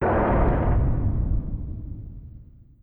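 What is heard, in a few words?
Collision Exterior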